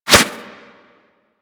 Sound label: Melee Swipe; Warframe; Kubrow